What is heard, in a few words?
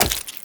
Wall Jump Flesh; Footsteps; WallRun; Warframe; Characters; Tenno